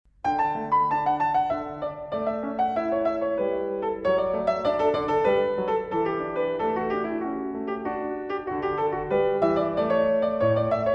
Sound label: Suite VI in D major Hornpipe; Purcell